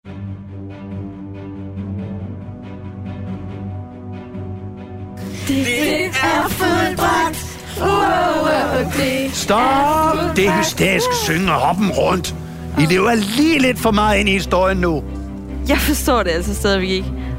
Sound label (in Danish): Drama musik og drama